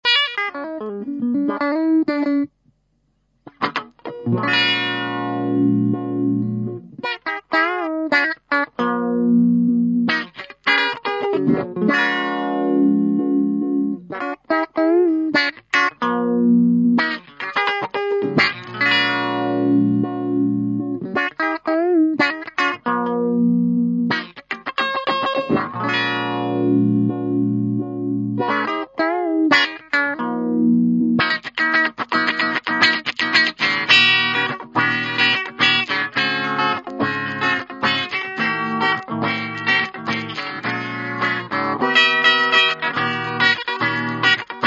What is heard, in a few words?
meatball; psst